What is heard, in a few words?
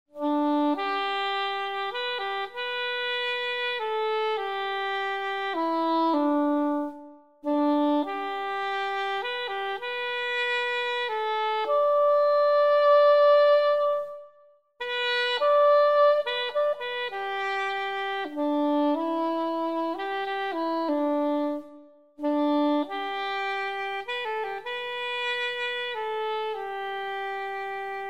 C soprano amazing grace, C-Soprano Sax; Conn C-Soprano; beautifully restored; using a Buescher C-soprano mouthpiece; Chicago IL; Little Sax; restored by cybersax